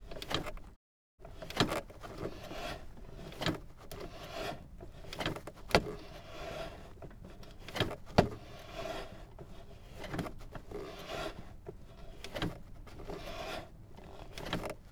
Trike, Tanarg, 912S, Foot Throttle, Motor Glider Trike; Air Creation; Handheld; Pole Position